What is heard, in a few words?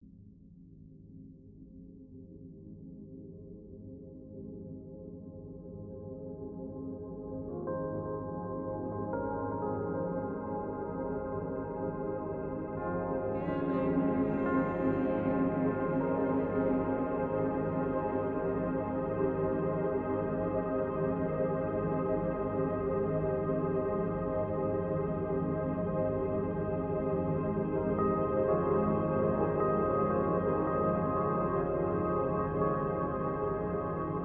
Sleep Soundscape; Endel